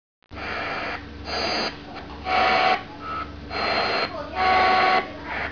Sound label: RADIO; Talking E-Mail